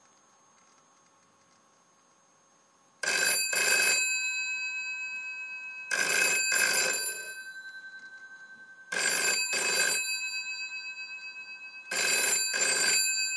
old school phone

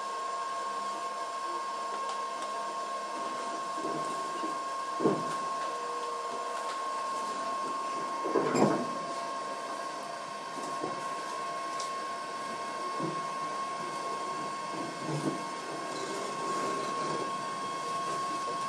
another horrible noise from this train

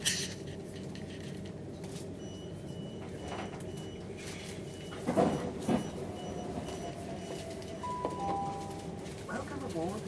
southern train ambient sound

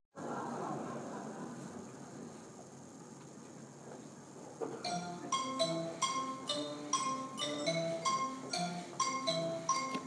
a snippet of the percussion ensemble

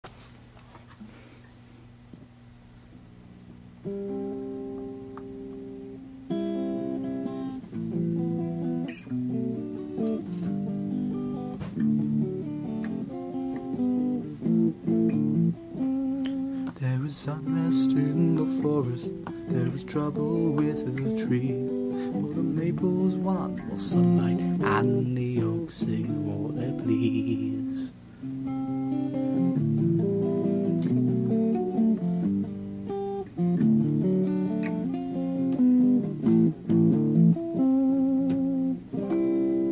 plucking

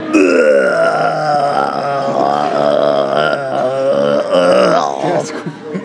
braaaaaaaa

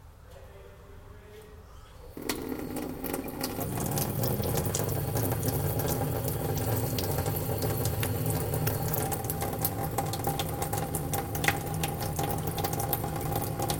running tap

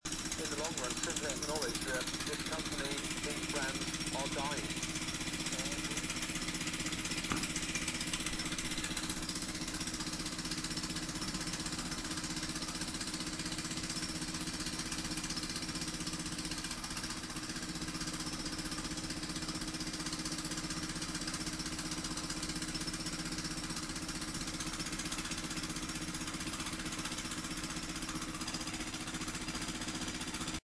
massive woodpecker

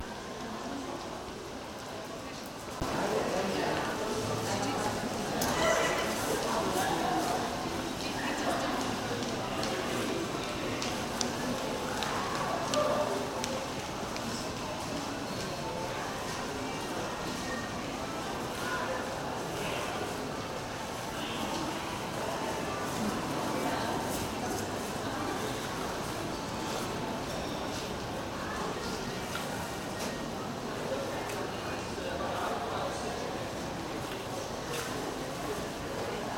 Merrion Centre 60 second ambient

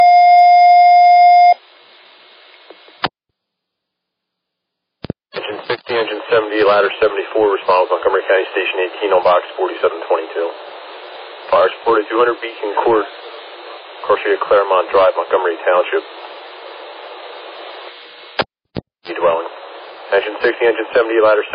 alert page; hilltown

